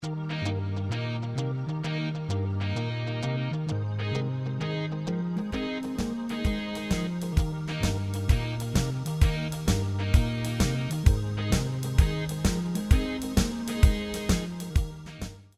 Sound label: Shuffle Rock